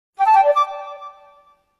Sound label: Flute NO bird open; Nokia; 7370 Asia